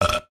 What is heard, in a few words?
belch